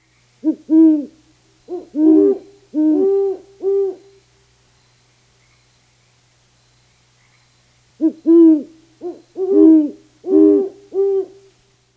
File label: Great Horned Owl Duet